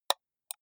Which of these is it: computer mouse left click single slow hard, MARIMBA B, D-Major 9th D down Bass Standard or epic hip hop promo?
computer mouse left click single slow hard